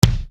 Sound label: Bass Dr, EuroDance Bdrums